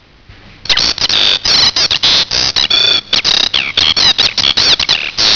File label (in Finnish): kiuru, lintuja